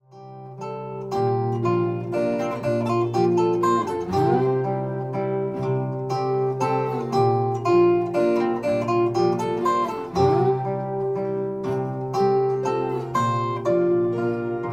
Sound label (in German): walzer